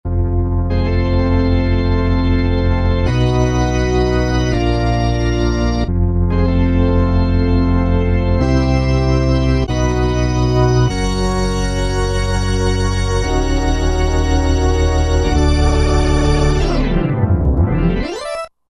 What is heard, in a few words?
Ring Organ, Roland JD-990; Factory Patches